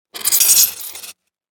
styrofoam scrape

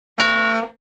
cartoon bell

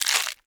bite

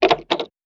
door lock

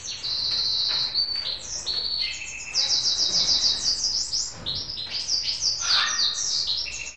bird trill

